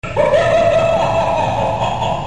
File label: Laugh